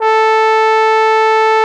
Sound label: A4, TROMBONE; Xsample VOL-3